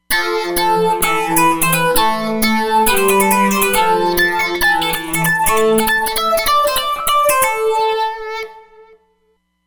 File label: DC Sidebands, Roland Fantom XR